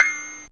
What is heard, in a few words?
Toy pian C5, Fairlight CMI IIe, Pianos